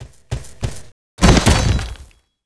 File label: Rhinoce die